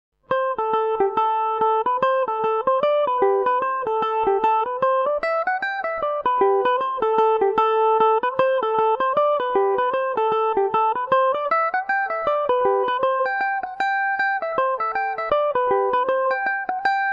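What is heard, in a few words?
A dorian, irish mandolin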